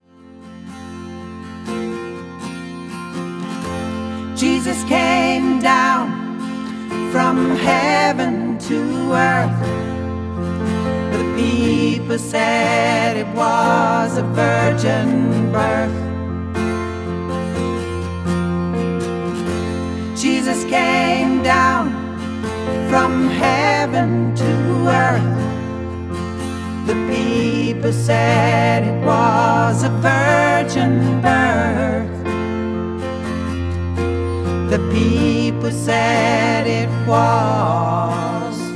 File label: Hymn